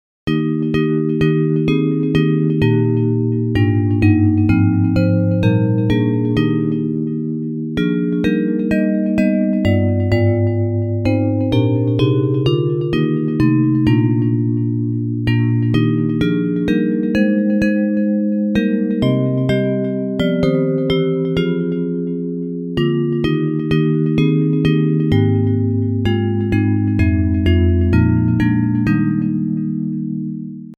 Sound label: Bells